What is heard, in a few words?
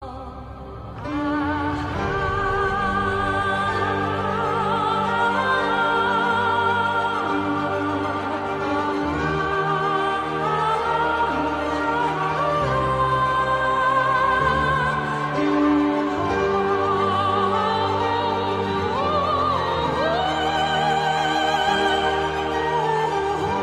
Orchestral